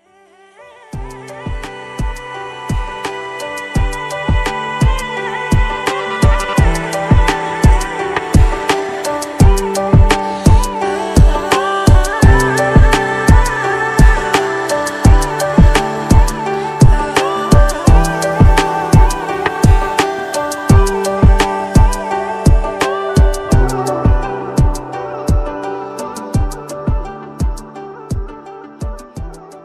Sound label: music ringtone east Nepal ringtone